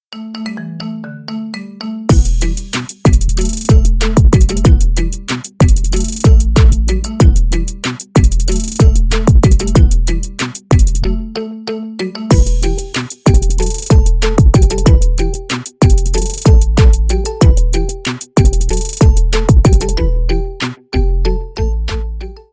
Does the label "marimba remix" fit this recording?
Yes